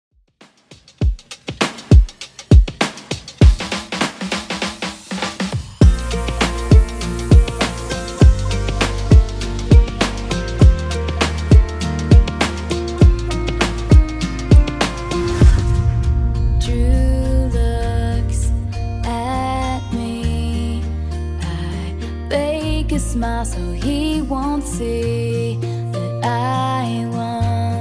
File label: Funk 100bpm; Redrum